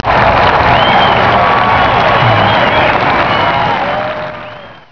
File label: Clap; MacEmatics 1.1